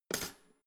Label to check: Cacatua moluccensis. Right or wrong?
Wrong